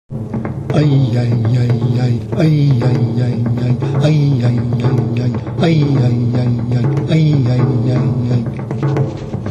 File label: faster drums